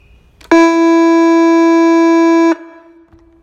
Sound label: Foghorn